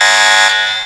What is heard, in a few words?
alarm; XDM; ambience